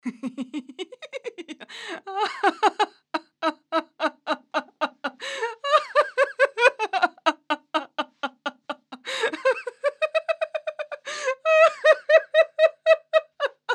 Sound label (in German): Lachen; hagenbeck